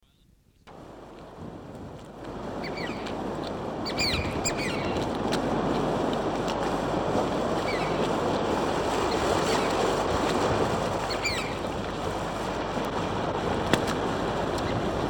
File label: chatham island oystercatcher song; conservation, native animals, birds, bird song